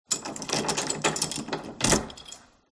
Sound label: close door key